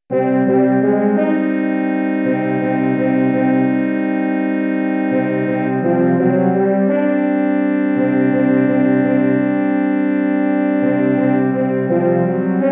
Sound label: 8 horns; Das Boot